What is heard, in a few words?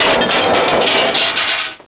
DEBRIS